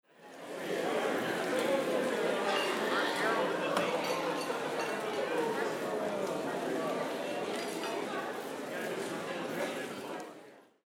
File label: restaurant